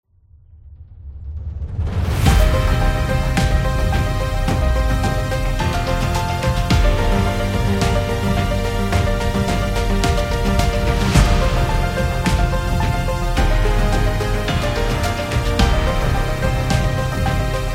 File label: Epic Piano